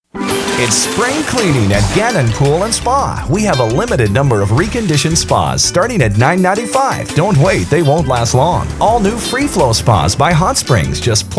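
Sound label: spa sales and service jingles, acapella jingles; Gannon Pool Spa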